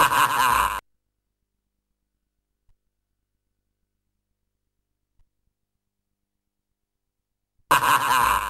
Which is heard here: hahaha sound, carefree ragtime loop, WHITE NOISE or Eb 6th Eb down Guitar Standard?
hahaha sound